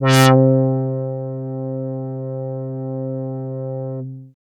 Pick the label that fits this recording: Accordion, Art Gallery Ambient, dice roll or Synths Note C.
Synths Note C